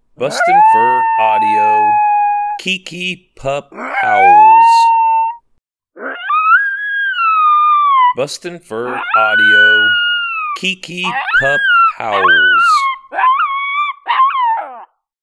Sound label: Pup Howls; KiKi